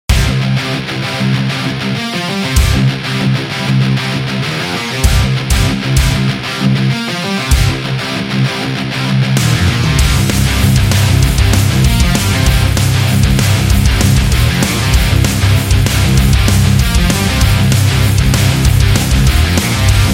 Hard Metallic Rock

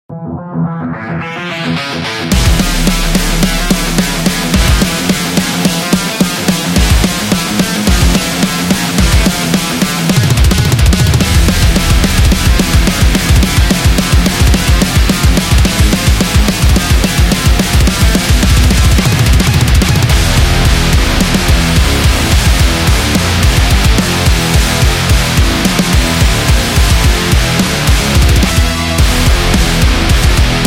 Metal

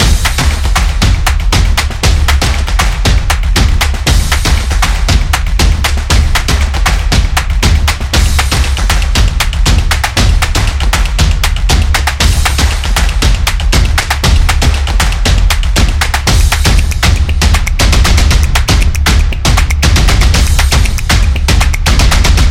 The Percussion Energy

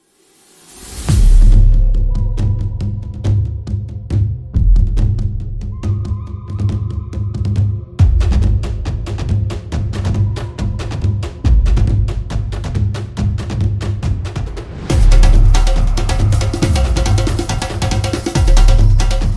Africa Tribal

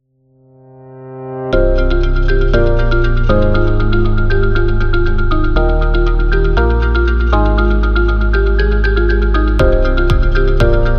Innovation Corporate; friendly

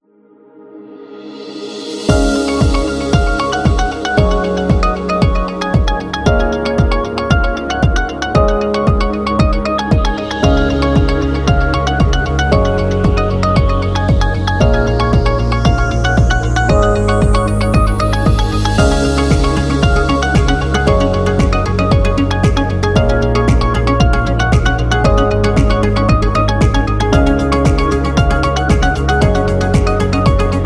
Uplifting

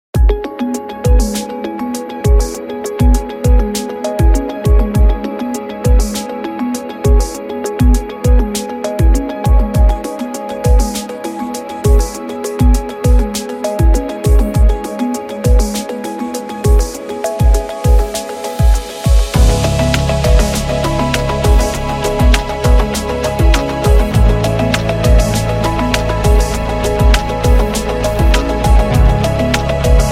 Corporate Technology

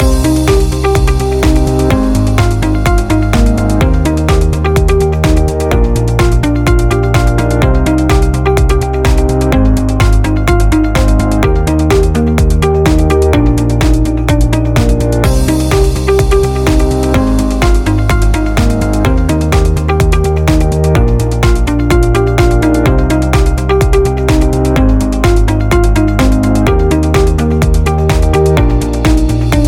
Corporate Loop

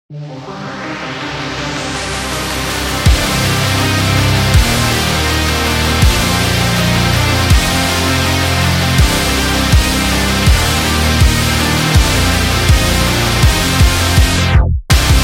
Metal Power

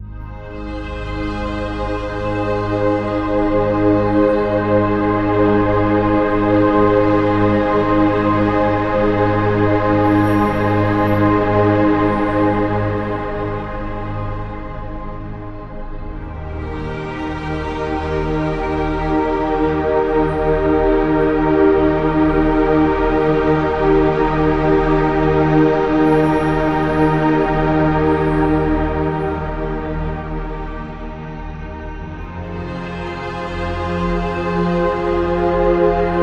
The Meditation, meditative

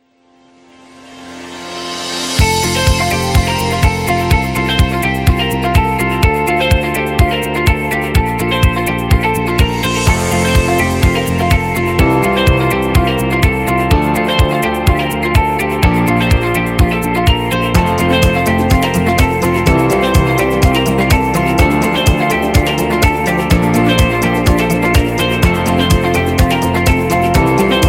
Corporate Identity

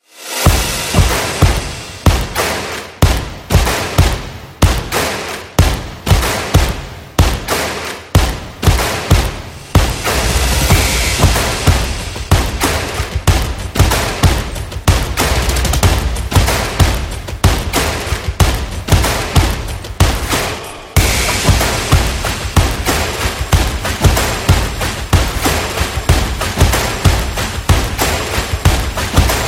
Stadium Sport Percussion; action drums percussion

